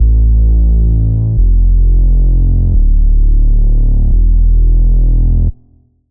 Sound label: Bass